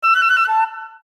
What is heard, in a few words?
Tranquil message; Nokia; Audio themes